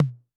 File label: Tom, Electro Kit